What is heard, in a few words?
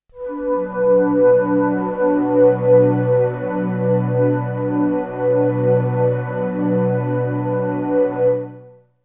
Synth; ZERO G - Datafile DISC 3